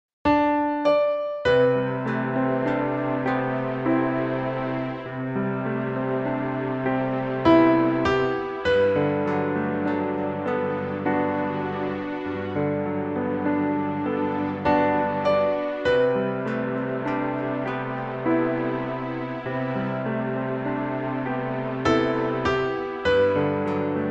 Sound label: waltz